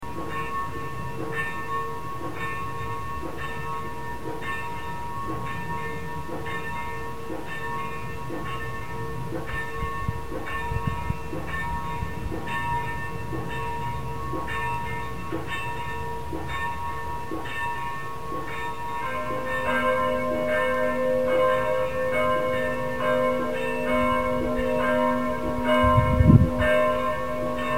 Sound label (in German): glocken